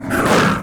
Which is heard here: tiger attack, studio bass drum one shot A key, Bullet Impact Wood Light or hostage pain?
tiger attack